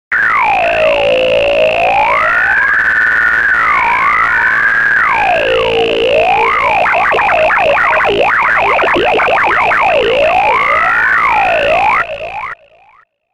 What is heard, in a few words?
Deley